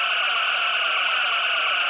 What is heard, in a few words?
federation phaser